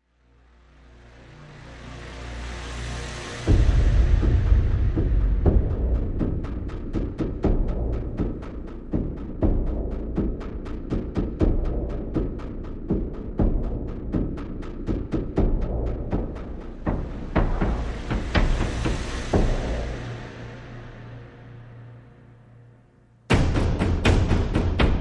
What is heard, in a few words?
Percussion Only